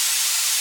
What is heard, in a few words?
Steam Loop